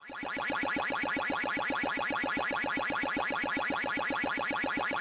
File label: power pellet; pac-man